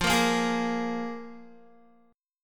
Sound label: F Minor Double Flat 5th F Guitar; Listen to Fmbb5 strummed